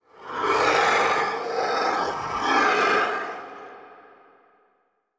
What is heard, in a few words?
skull fly